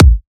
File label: Riddim Kick